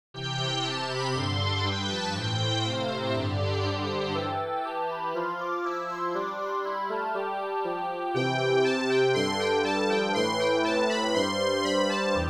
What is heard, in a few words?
Quartet; Remake SF